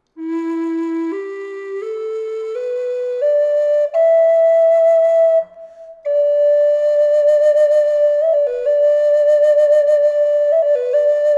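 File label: reverb, flute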